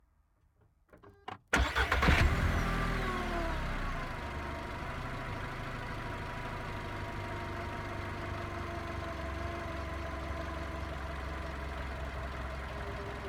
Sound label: Volkswagen Golf V 1.6 FSI engine start idle gas pressing mono; Soundholder